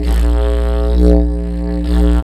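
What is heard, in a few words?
DIDGER, didgeridoo; E-Lab; X Static Goldmine 1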